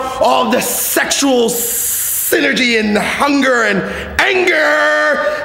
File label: crazy breathing anger short